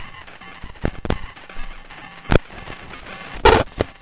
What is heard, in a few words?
probing glitch; Computery or Science Fiction